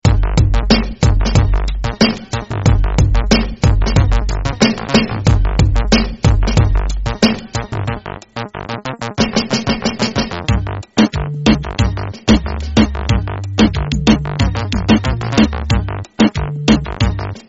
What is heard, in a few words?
Reggaeton